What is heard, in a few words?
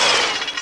lance field attack end, weapons